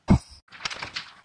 leafpile explode

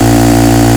i1 small, engines; acf engines

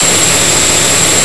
turbine small, engines; acf engines

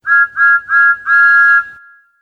bell

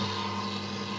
mixer loop

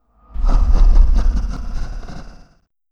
apophis snicker

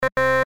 beep error, keypads

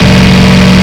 v8 small diesel, engines; acf engines

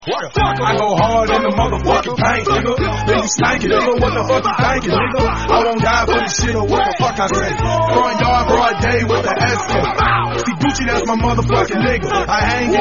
song

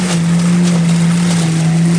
trashsuck loop

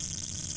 panel electrical wire

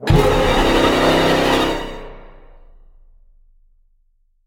impostor kill music

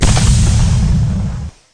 explosion

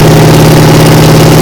r7 petrol large, engines; acf engines

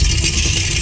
surface grind; vcmod

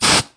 airburst

